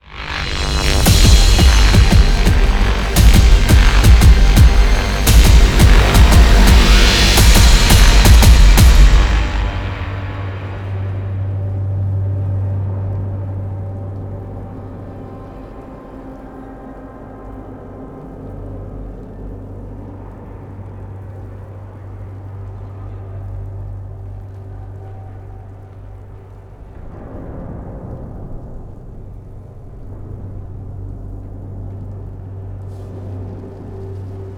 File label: Music Winning End; Battlefield 4